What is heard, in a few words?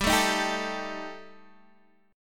G Suspended 2nd Sharp 5th, Guitar Open D